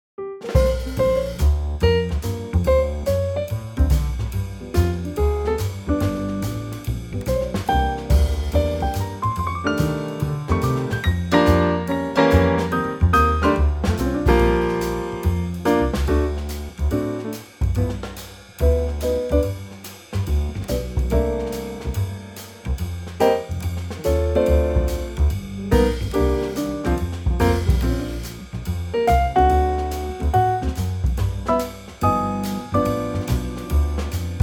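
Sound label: Bb Trio, backing track; key - Bb - vocal range - Bb to C; Fresh Trio arrangement of this classic standard, sticking more to a mid swing tempo.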